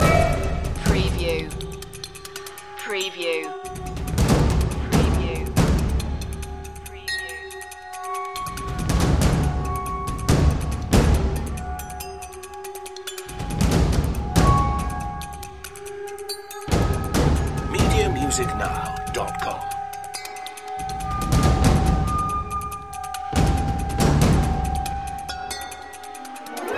its gonna be serious loop, Soundtrack Suspense / Tension Royalty Free Music Loops